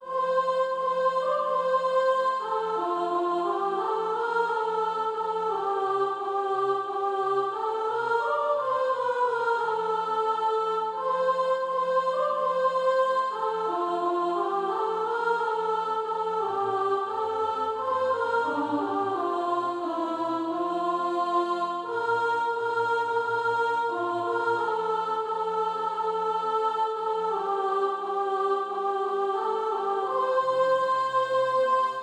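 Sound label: soprano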